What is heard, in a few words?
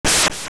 Wizzrobe Shoot; Wizzrobe shooting magic